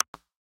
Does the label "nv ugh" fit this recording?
No